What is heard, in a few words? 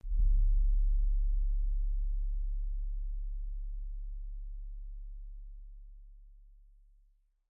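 music sub drum hit; The Curse of Nordic Cove; MusLevel1 SubDrumHit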